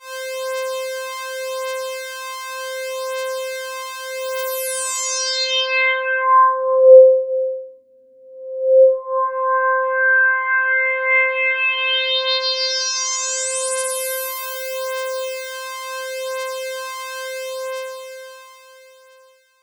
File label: C4, SynthLine